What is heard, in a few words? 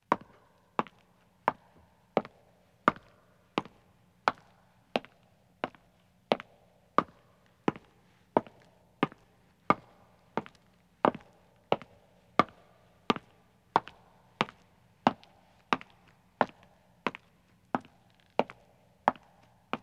Stone Walking, 1 Man Starts An, Footsteps; BBC Sound Effects Library